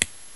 CLICK

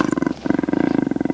roar

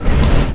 DOOR

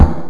crash

